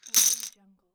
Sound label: poker chips stack throw; stormwaveaudio